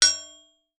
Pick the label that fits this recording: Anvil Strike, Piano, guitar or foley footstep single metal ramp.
Anvil Strike